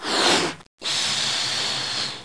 balloon; McDonaldland - Silly Games; McDonald's; snakes

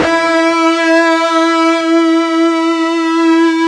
fuzz E3

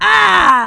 pain, player; Quake 2; female

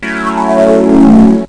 reso synth, chords

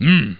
MMMM

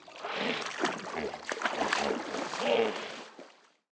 water; PHARAOH

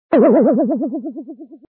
death Sound; save princess minnie

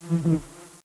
fly; Close Combat

